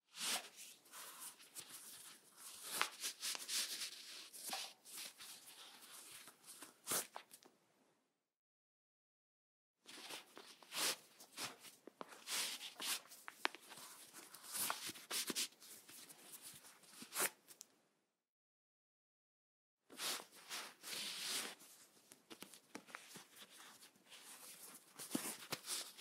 tying shoe laces; بستن بند کفش

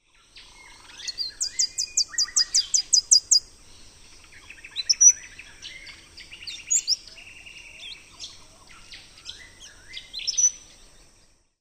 Mistletoebird

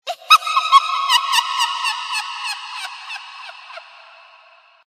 Scary doll laugh

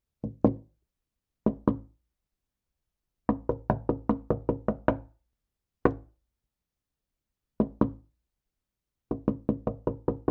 knock on window

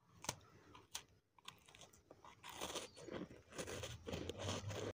eating cookies

